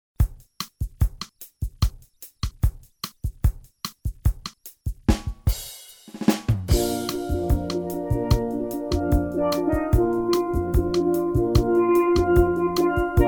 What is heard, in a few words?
steel vibrations Sample